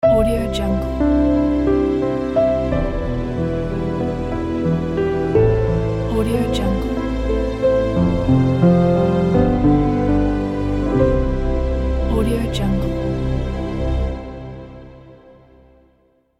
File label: romantic logo